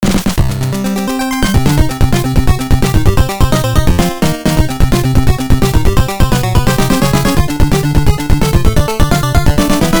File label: Battle Mode; konami famicom chronicle vol.3 rom cassette edition